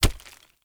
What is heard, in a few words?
unarmed punch impact rock; Weapon Impacts; Player; Environment